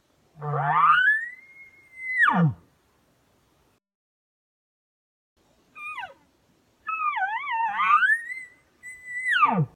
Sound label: Calls Round Up Bugles